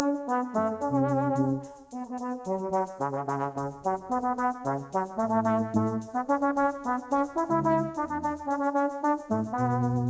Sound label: soviet march; lyria